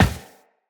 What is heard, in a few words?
Run Glass, Footsteps; Tenno